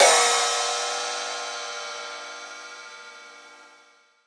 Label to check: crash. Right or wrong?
Right